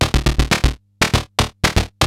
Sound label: SH101 LOOPS; ELEC-LOOPS; Syntec; Wall of Sounds; TECHNO-BOX